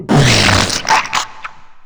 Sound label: death; pestilence